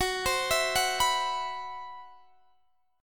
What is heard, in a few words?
C Major 7th Flat 5th Gb, chord